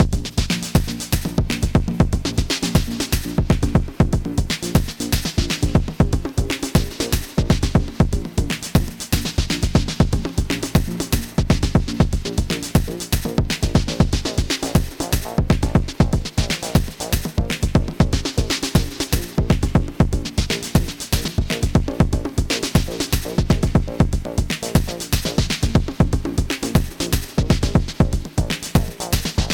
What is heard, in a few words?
non-techno groove; Techno System non-techno groove